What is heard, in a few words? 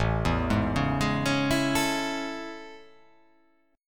A Major 7th A